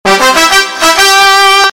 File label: Fanfare Sms